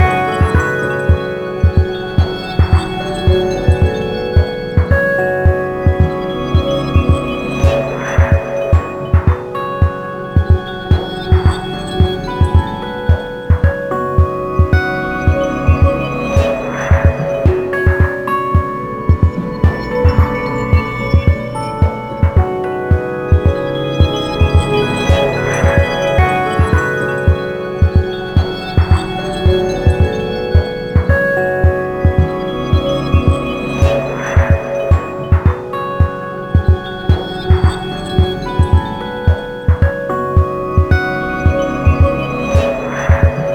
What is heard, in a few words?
Music pause; Fly'n